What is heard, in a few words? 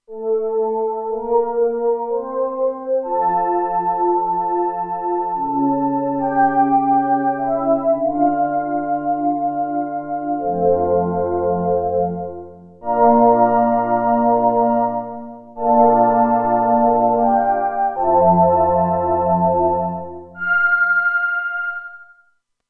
Voices, Roland Alpha Juno-2; Original Sound Chart patches